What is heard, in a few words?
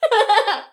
giggles and laughter; SyrinScape